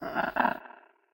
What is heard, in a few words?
phoenix base chirp; smite; npc